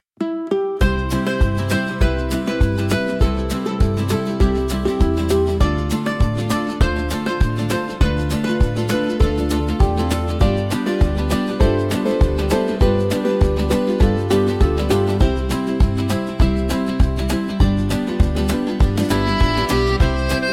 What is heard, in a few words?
fantasy music nature forest; ghibli style; wind healing nostalgia